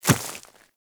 Small Walk Dirt, Footsteps; Warframe

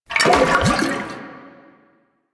Alchemy Grenade Aim; Warframe; Entrati

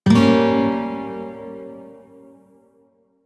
Shawzin Phrygian Chord H, Music; Warframe

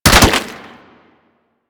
Infested Devourer Swing Hit B; Warframe

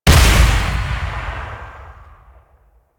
Kuva Hek Secondary Shot, weapons; Warframe

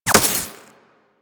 Laser Impact Glass Large; Warframe; Weapons; Bullet Impacts

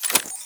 Reload, Pistol; Weapons; Grineer; Amphis

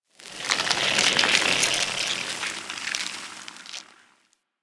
Infested Death Disappear; Warframe

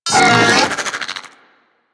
Vocal Attack; Warframe; Corpus; Arachnoid Wraith

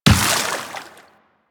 Run Water, Footsteps; Warframe; Tenno

